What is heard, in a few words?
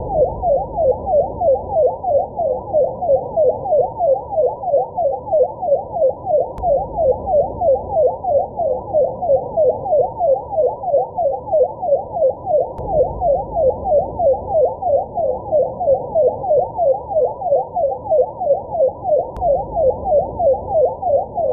Ambulance; Five Nights at Ronald's